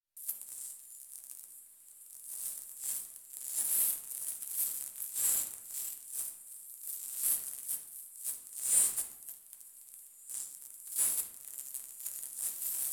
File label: Electrical Arc Buzzing - Sparks Only; Hollywood Edge; sound designer's toolkit v2